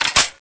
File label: draw; arx160